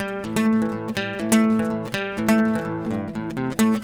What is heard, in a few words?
ACST GUIT ETHNO 125 A, Guitar; eJay